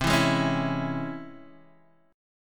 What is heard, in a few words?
C sharp Minor Major 7th, chord